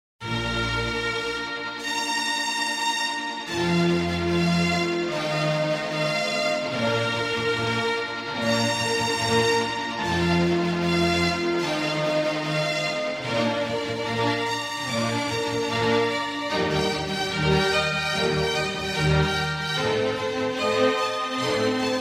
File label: Easy Listening & Classical; Sound Ideas